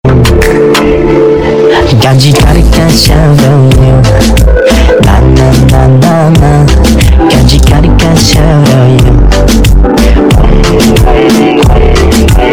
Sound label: bass